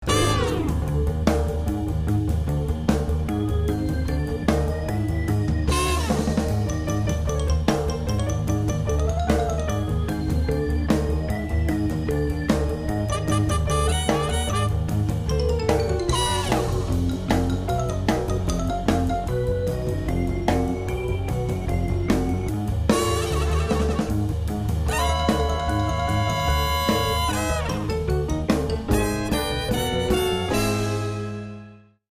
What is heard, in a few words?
music track; Ed Edd n Eddy